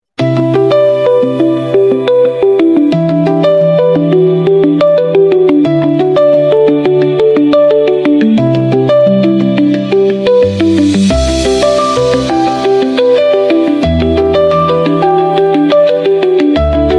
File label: Void Tone, ringtones